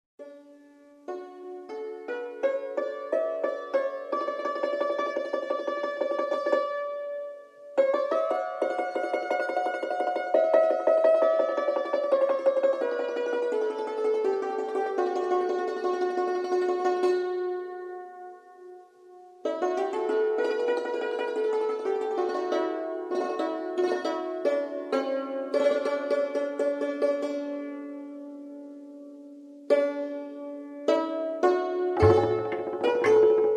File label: Santoor Interlude